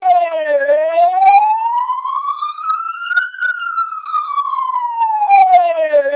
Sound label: siren twelp; Siren only